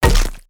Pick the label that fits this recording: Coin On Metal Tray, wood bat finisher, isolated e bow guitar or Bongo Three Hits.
wood bat finisher